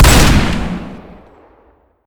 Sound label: mag fire, weapons; deluge; weapons v3